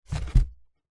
foley cardboard box empty pick up from hard ground